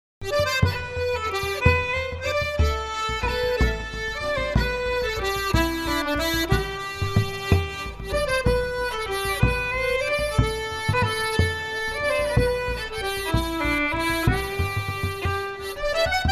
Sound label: Lead